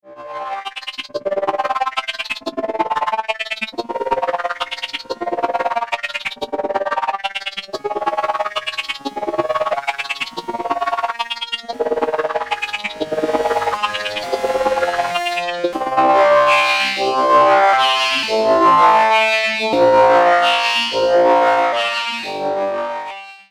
Dist und; Murf B; ESP

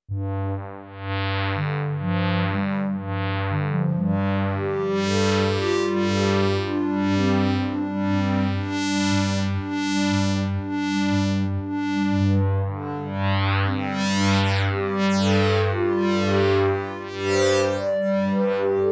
bewegtes pad

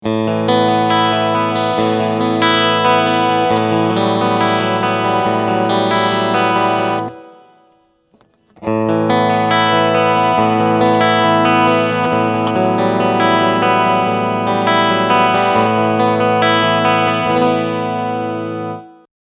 Steg PU Wechsel SCHB

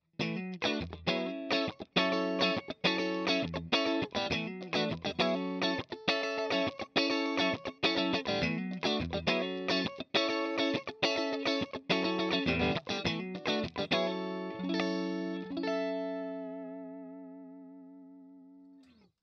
Bypass durch das Wah

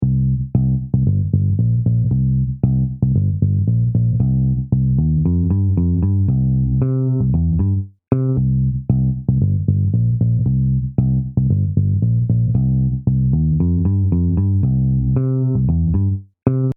Bass bearb

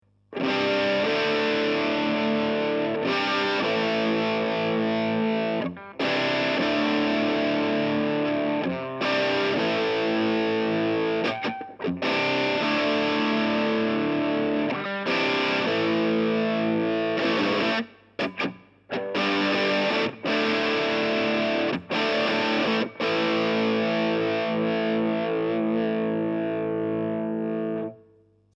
steg angezerrt knaggs halbe

